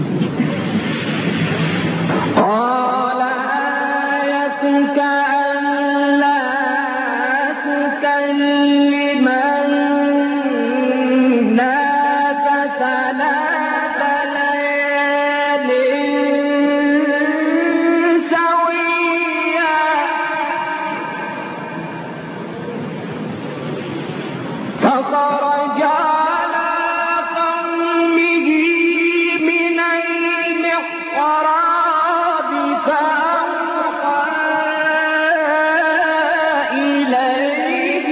فراز زیبایی از سوره مبارکه مریم; شعبان عبدالعزیز صیاد

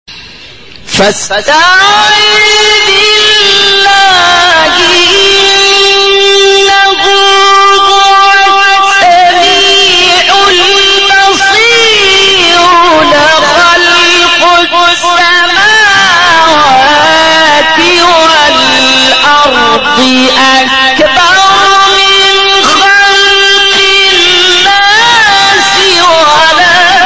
فراز زیبای سوره غافر; استاد محمد اللیثی

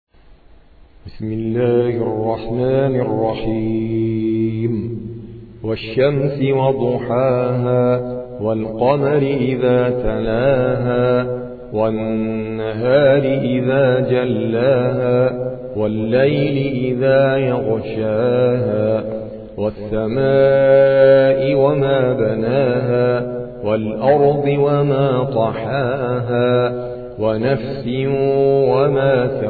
ترتیل قاری هندی; سوره شمس

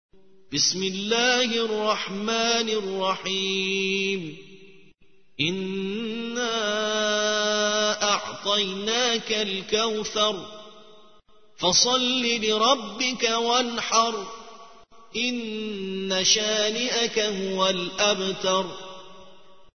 ترتیل سوره کوثر

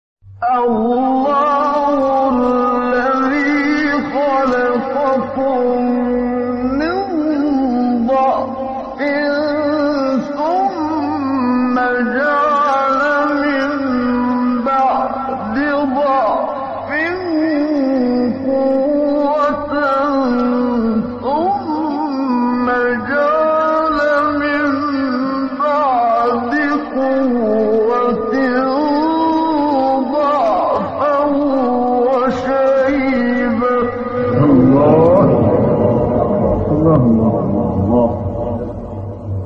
فراز زیبای سوره; خلقت انسان; روم; استاد منشاوی

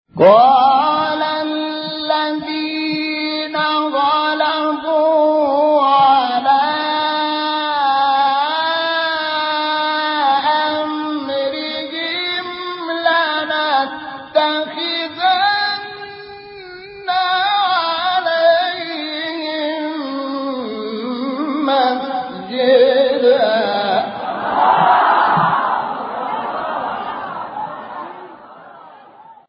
تلاوت مجلسی سوره کهف; سوره کهف آیه 21; شحات محمد انور

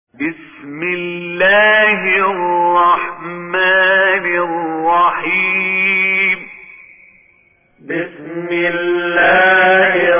تحقیق آموزشی; سوره انشراح

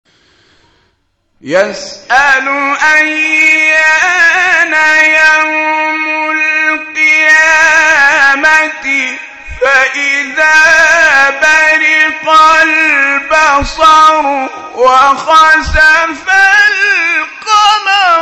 تلاوت آیه سوره; 6 تا 11; قیامت

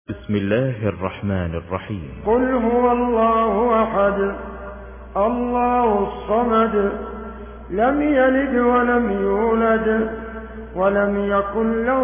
ترتیل بروایت حفص عن عاصم; سوره اخلاص

